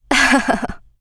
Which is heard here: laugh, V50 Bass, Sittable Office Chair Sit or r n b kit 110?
laugh